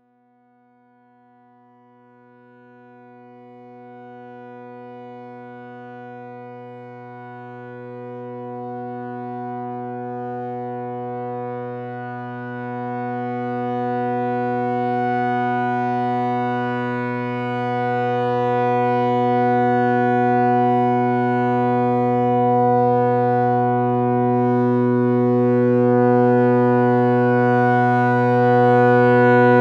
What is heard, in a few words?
A110 1VCO